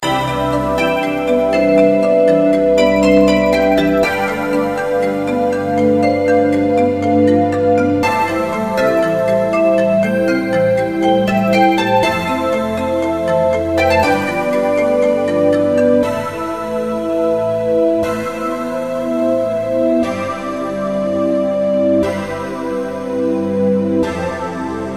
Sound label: Old Menu; Emma Lost in Memories